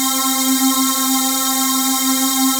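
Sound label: WASH pad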